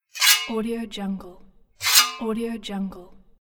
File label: machete unsheathe; circutreeaudio